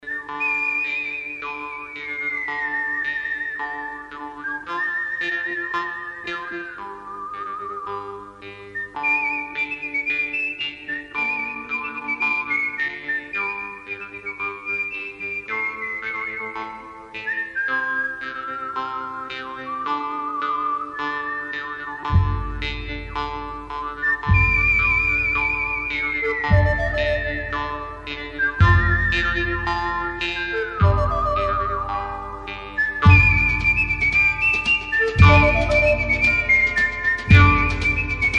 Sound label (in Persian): ring khareji